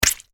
Insects Bug Hit Windshield; Miscellaneous Insect; Animals

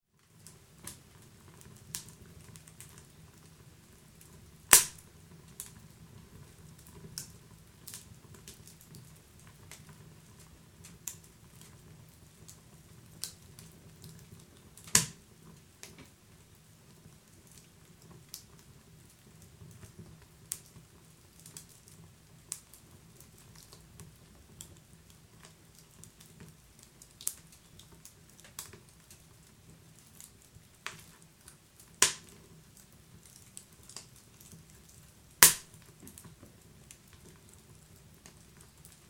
Wood fire, inside stove; Fireplace; Household; BBC

